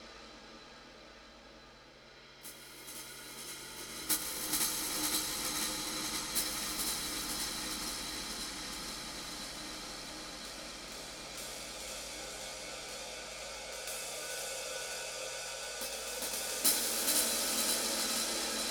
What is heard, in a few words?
Cymbal Mood, Psychotropic Cymbals; Ambient Cinematic